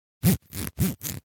Zipper Open Close Up - Four Short Open And Close - Stereo Pan Field - Foley Cloth; Foley Cloth Movement; Hollywood Edge; sound designer's toolkit v2